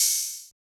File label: Open Hats